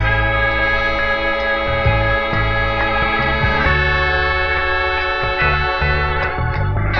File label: horns, phonky loops